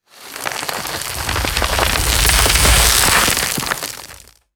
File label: dry dirt and gravel sliding and falling; dirt sand